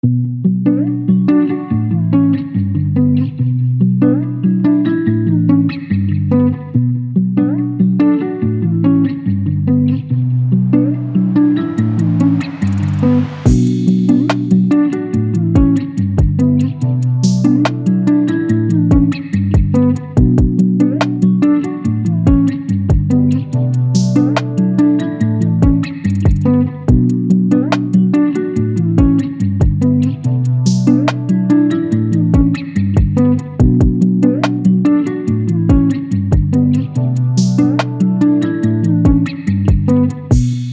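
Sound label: Waves of Blue D major 143 bpm; VollaCreates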